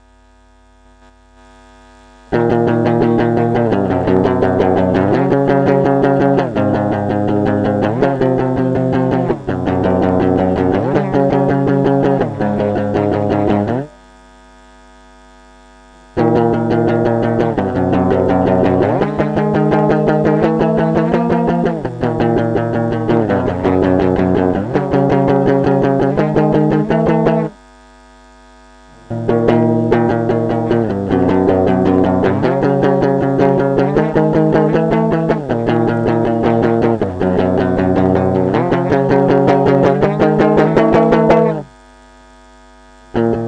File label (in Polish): anarchia solo